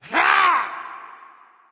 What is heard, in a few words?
grunt; smite; guan yu